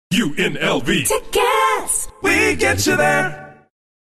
jingle

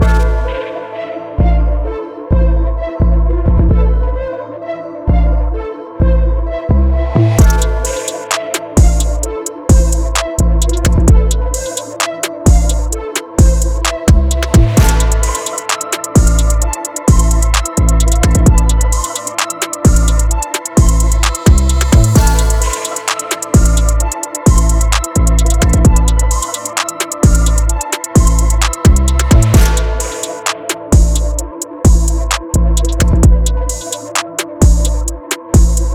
hiphop

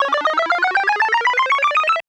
feed success

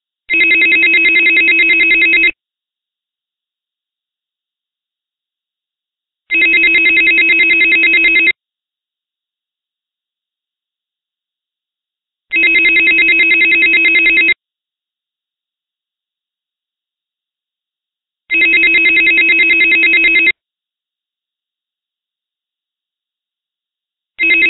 external call

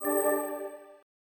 upgrade, player

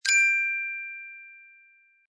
glint

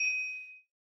message

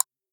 Click

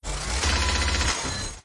minigun

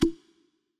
Fire Bullet

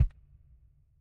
button Hover; asgardian stones

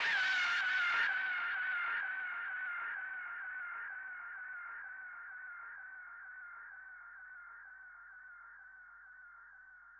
FX, viral hiphop

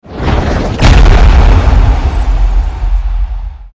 hummer

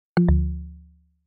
incorrect

